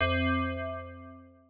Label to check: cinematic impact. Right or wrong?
Wrong